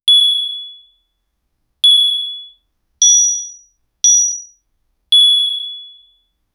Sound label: video game 5 combo score; achievement